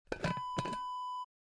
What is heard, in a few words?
glass jar lid open